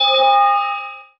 deep ding

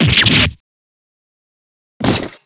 fall

